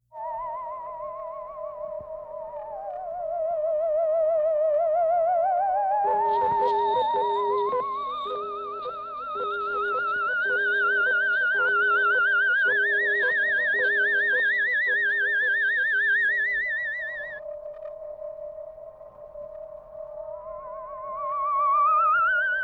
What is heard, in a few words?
Sci Fi Theremin